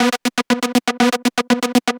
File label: Progressive Synth, Trance